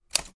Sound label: Smith Corona 1940 vintage typewriter single letter